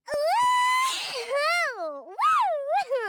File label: Woman Dance Happy; voice; Zomboid; Lifestyle